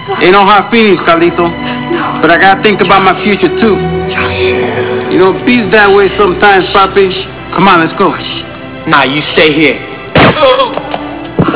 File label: pachanga